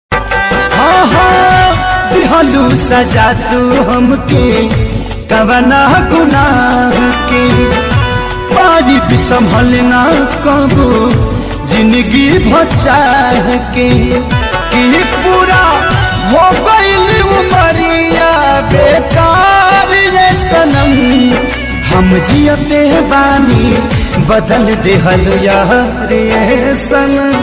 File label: Bhojpuri status ringtone